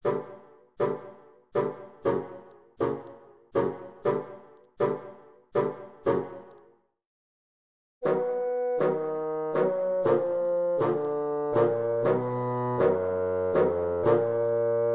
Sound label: Comical Orchestra